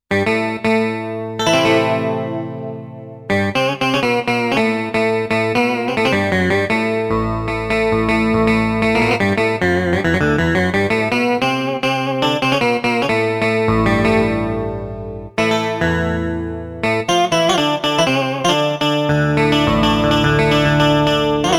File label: Electro Sas